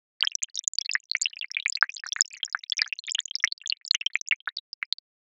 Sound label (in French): gouttes